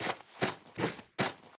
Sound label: Map walk; Puzzle Chronicles; PS3; PSP; X360